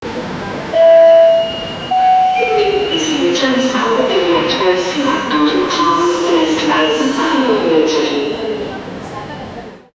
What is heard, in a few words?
tokyo train station